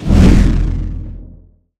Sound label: Teleport; Boss Rush